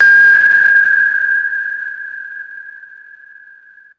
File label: high pitched resonant sonar ping; boats